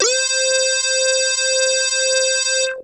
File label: Techno leads, hits and riffs